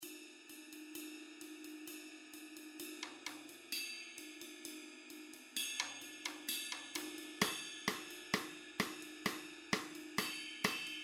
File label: American Rock Song Fairfax Kit